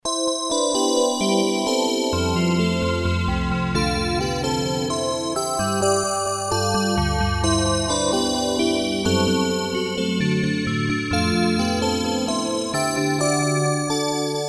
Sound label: Paradise Bells; FMagia; dinopark